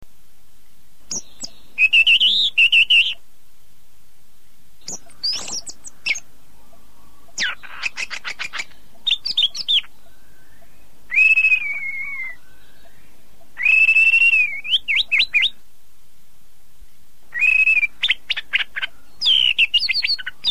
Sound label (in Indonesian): suara burung anis merah